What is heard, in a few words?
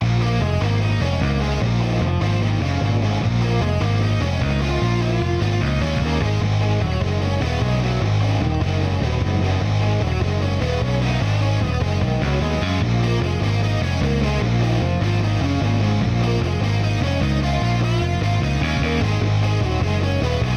guitar bass chorus